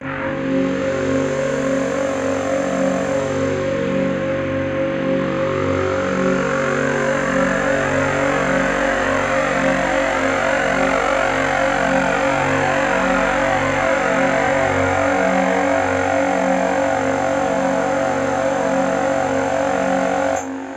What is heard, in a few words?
Atmos; neuro; Optiv & BTK